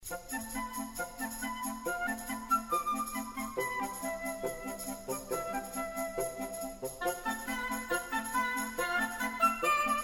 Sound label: Orchestra; Saria